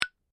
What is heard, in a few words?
RHYTHYM STICKS, Cheap percussion toys; Miscellaneous Musical; SoundEffects-Foundation4